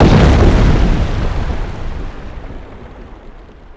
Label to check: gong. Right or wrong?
Wrong